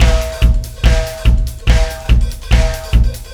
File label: Beat, Loops